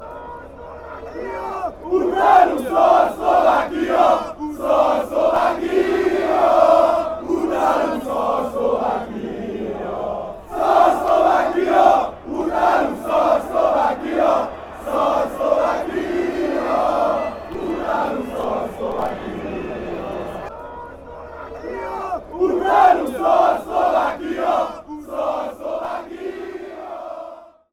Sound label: hungary chant